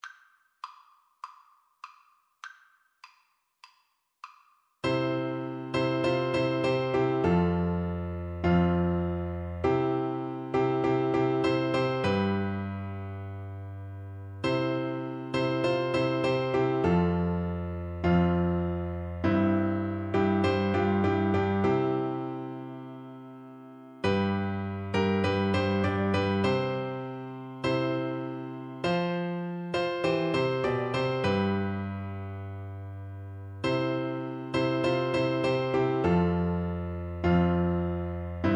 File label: what a friend, Flute